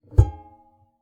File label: Classical Guitar Extended Technique